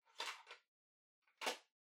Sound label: Portable Cassette Far Insert Close